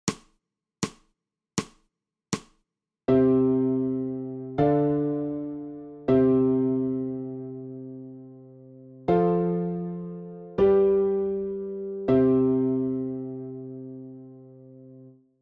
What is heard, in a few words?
ALTO